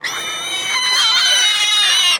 ANIMAL FARM Pig, Baby, Scream, Shrill, City Street; Pig Farm Animal Groan; Articulated Sounds